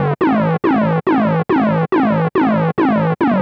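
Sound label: SYNTH BASS DANCE 140 A; eJay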